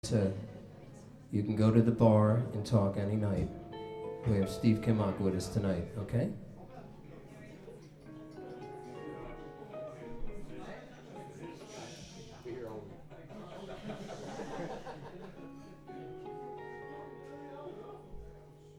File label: Crowd